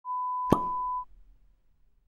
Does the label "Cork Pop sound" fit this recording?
Yes